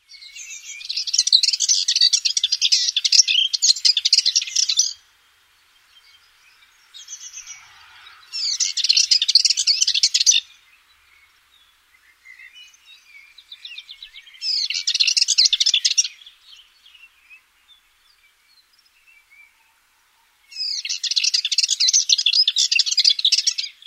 eastern subalpine warbler

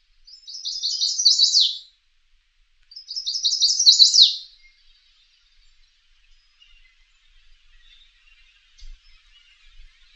chestnut sided warbler

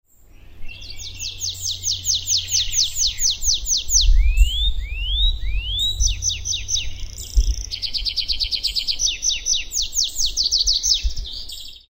tree pipit

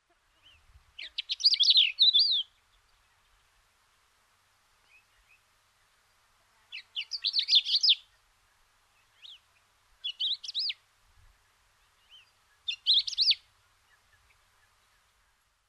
crested lark

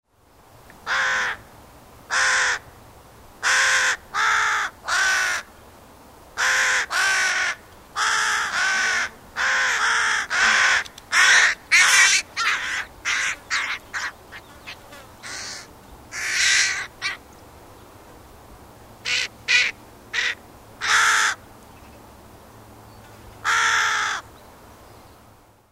carrion crow

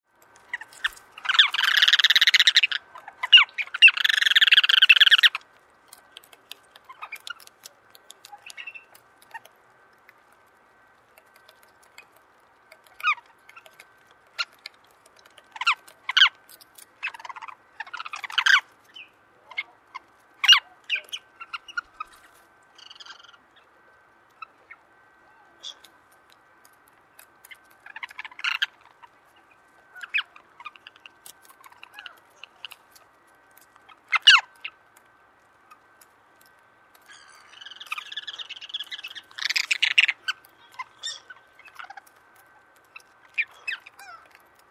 turnstone

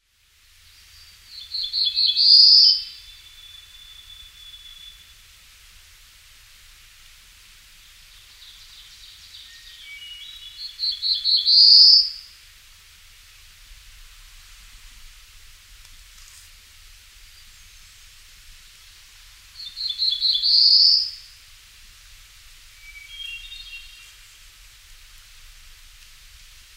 black throated blue warbler

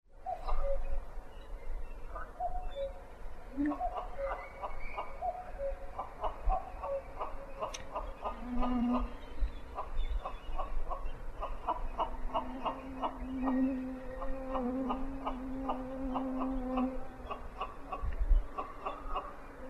capercaillie

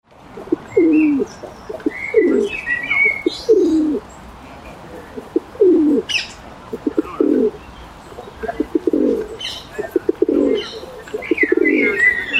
feral pigeon

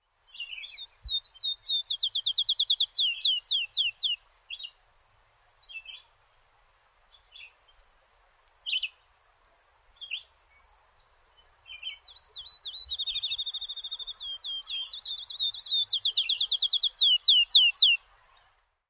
field sparrow

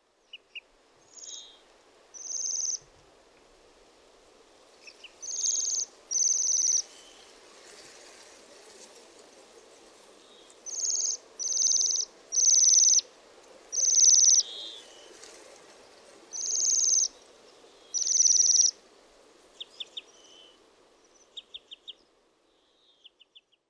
waxwing

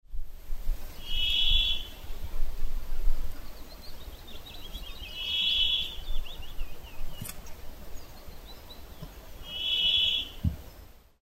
brambling

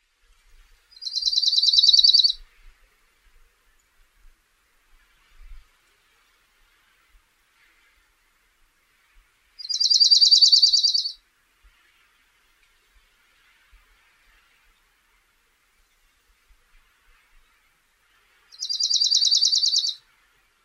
dark eyed junco

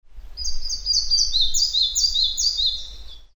red breasted flycatcher; grzedy

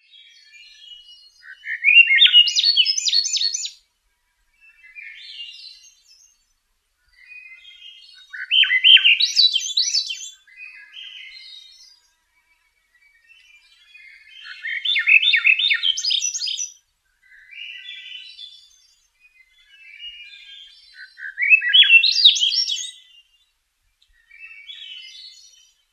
swainsons thrush

